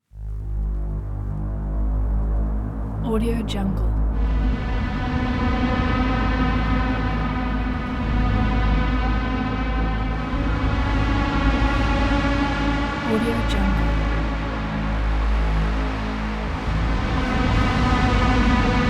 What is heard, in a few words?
doom drones 2 analog performance; soundmorph